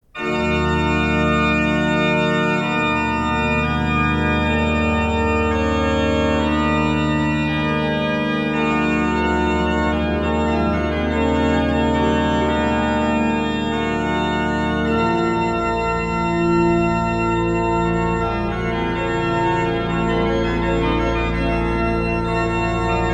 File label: toccata; Toccata per l'Organo col contrabasso; FRESCOBALDI; Fantasie